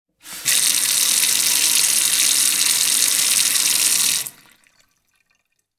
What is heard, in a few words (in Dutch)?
lopende kraan